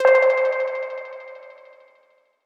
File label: PLUCK Ricochet Delay (C); One Shots; Cymatics; Mystery Pack Vol 4